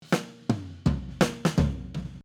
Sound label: Tom Fill Sustain Down